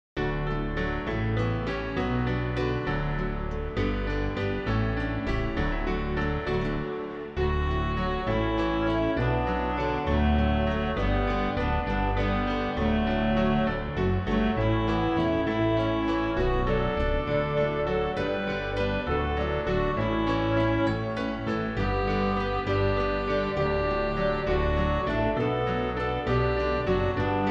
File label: instrumental; New Hope Music